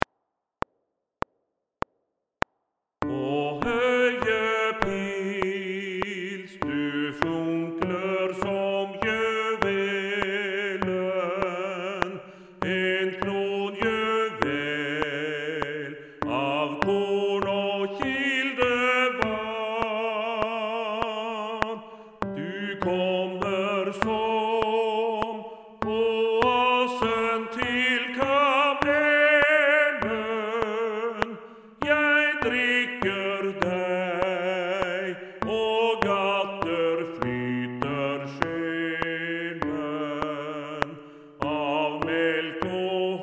Bass

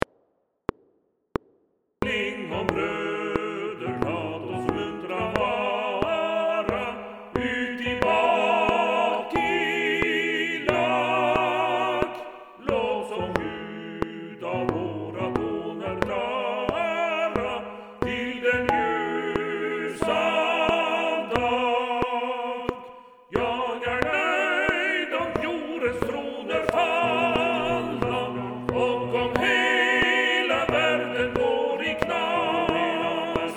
Tutti komp; Klingom brødre

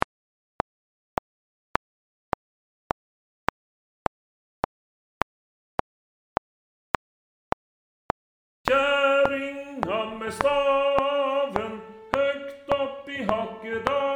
lydspor Tenor 2 Click; Kjerringa med staven clicktrack

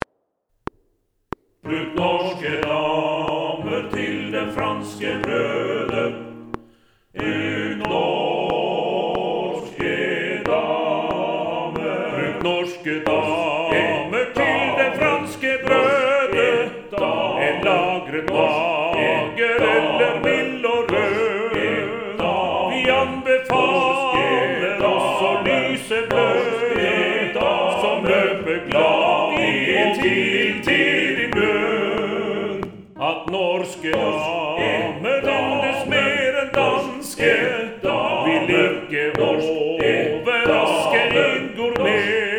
TUTTI

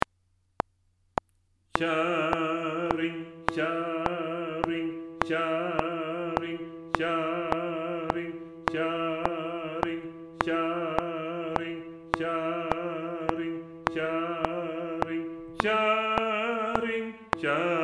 lydspor Bass 1 Click; Kjerringa med staven clicktrack

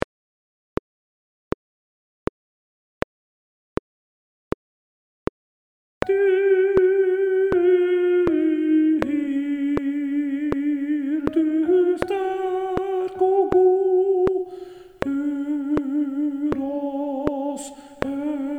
lydspor Tenor click

